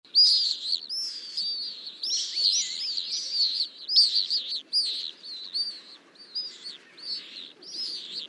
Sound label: pallswift M F FC; metzoke dragot